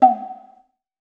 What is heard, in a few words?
Tsuzumi p, Percussion; Roland BK-5